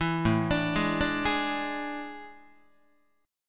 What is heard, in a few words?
A Major 7th Suspended 4th, Guitar; AM7sus4; arpeggiated